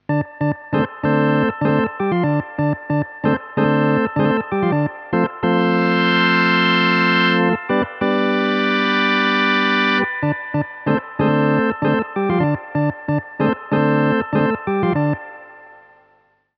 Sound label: Farfisa; arturia; Farfisa V